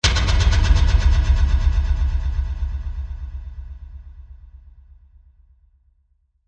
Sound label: Hit Delay Fast, Horror, Accent, Transition; Production Element, Imaging Element; BlastWave